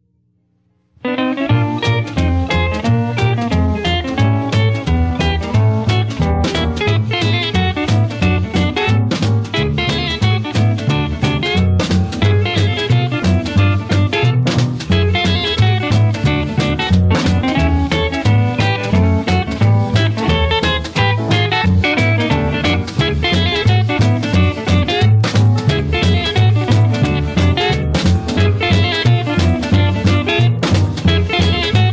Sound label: Nashville Steel Guitar